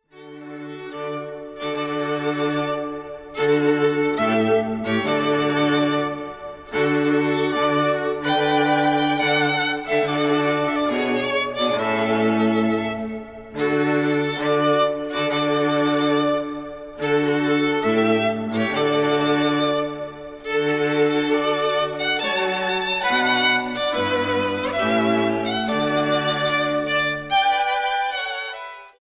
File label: Bridal Chorus